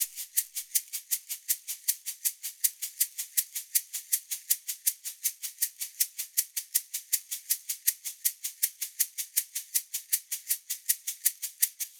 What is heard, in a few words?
latinshaker loop 80